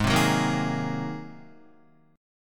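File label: A flat 9th A flat Guitar, chord; Ab9 strummed; JGuitar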